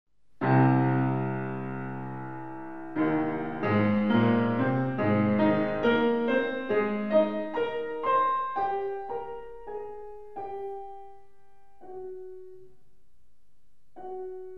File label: Chopin Piano Recital Ballade op.23 Pianist live